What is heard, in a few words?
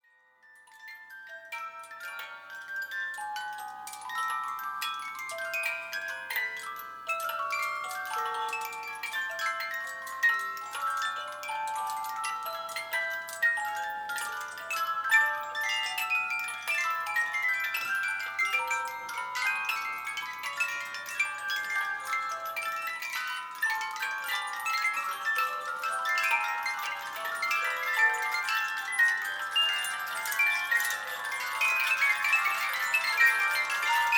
Musicbox